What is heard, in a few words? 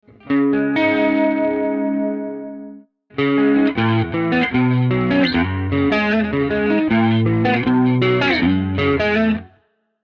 Chords Message